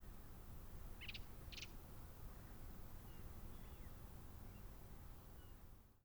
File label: gak, Redwing Turdus iliacus; Arne, Dorset, England, 00:24, 25 October 2016